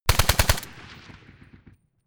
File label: rifle ak-47 exterior fire burst